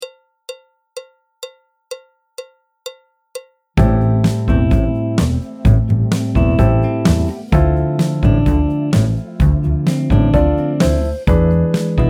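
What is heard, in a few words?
Tango backing track, Jazz; clarinet, flute, tenor; Jazz Syllabus Level 3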